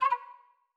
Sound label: error, interface sfx; obsydianx interface sfx pack 1